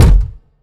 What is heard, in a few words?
wet bass drum single hit F sharp key; kick drum; kick drum samples